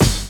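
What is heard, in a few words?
drum chop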